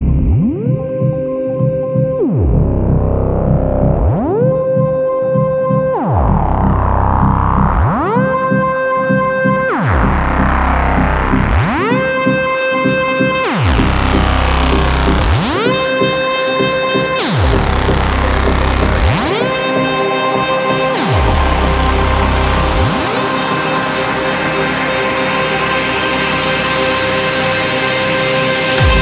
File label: Trance Alarm Ring